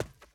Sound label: Sprint; SandBox Musics